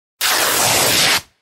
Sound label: cloth tear